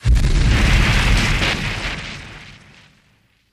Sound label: Flame Burst Rumble, flamethrower